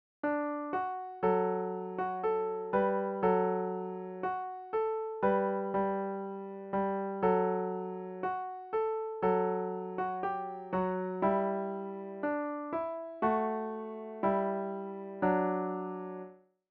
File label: piano